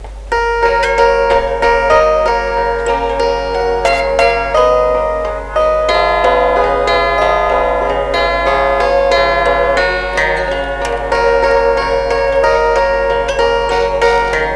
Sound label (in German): Chordephon